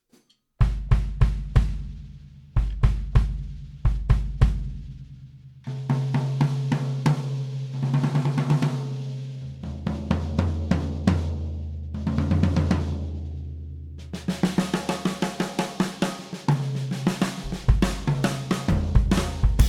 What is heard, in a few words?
copper kit, drums; matt chamberlain; craviotto ak